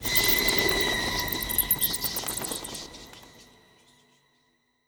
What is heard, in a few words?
Dis Bats